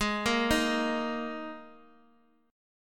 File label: Gsharp Suspended 2nd, Guitar, Open D; x,x,x,2,1,1